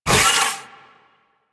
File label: Kick Whoosh; Warframe; Venus; Venus Terra Moa; Abilities